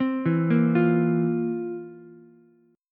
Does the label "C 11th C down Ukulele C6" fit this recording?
Yes